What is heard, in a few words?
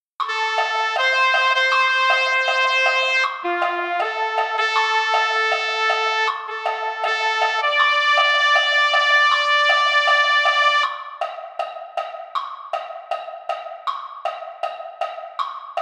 Trumpet; concert corps